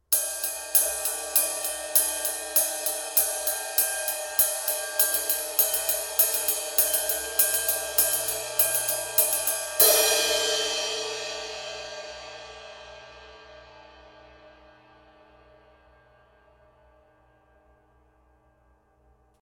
zildjian

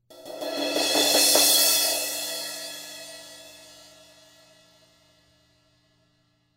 build crash, zildjian

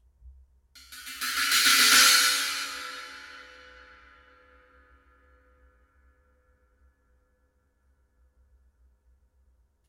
zildjian

